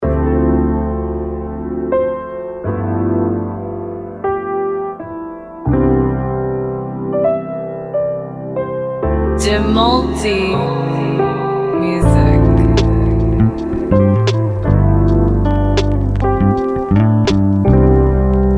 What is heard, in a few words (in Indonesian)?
lofi, alunan piano yang ringan